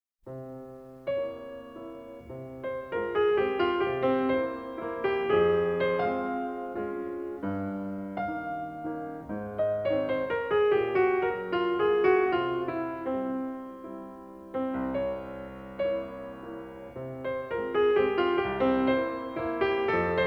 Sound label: chopin mazurka op 63 no 2, pianist